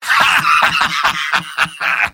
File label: laugh happy; Soldier; MvM